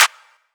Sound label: Klap; drumkits; fl studio; dstarkels plugnb